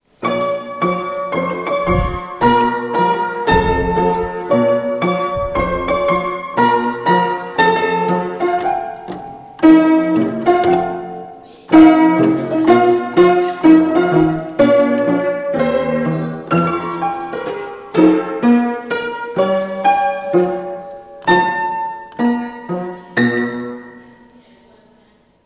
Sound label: piano; Stranghall